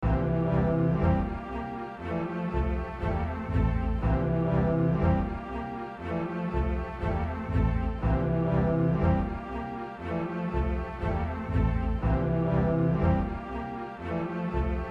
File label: Classical Interlude